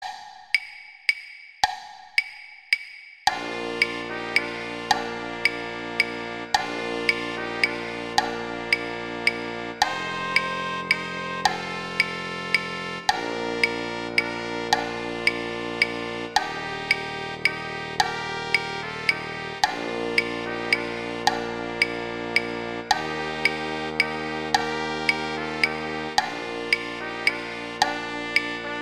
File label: Silent Night Trombone; Brass; Gruber